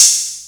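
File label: 808 open hat, DRUMS ROLND808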